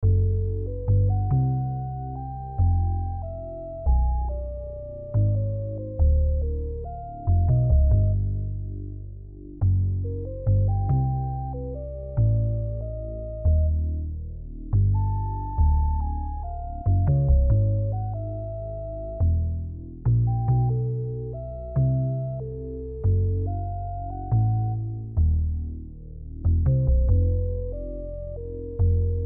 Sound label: chords melody